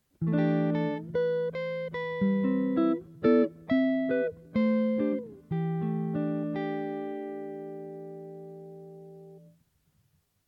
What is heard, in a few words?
chord melody guitar